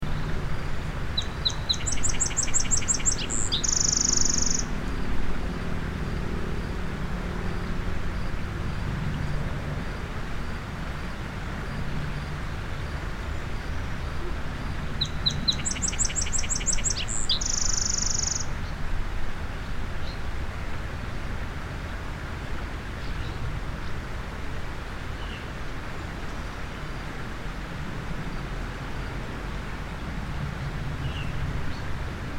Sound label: Song Sparrow